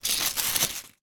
potato chips crisps small pile movement with finger